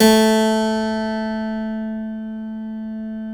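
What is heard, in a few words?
A2 clavinet right, Keyboards Acoustic; USB Soundscan vol.17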